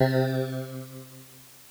negative; 8bit; castle game engine

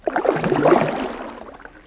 player swimming; fps game

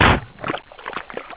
blowup; Pacman

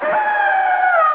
ghost dead; Pacman

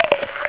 nam nam; Pacman

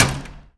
crash, cars; two cars

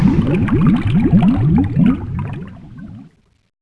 player drowning; fps game

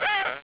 ghost, Pacman

